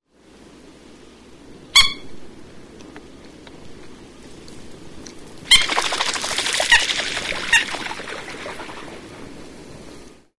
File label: Coot, waterbirds